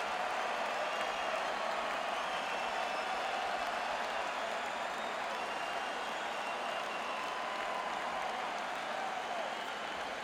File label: Crowd; Albany, NY - Times Union Center